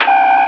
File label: scifi